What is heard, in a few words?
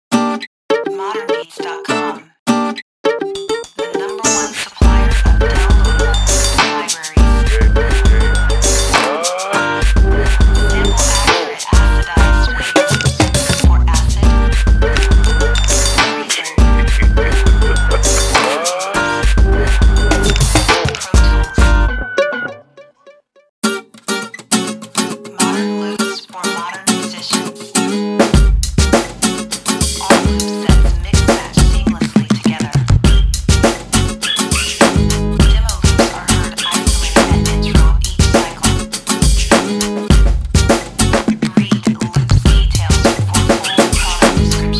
Urban Guitar Loops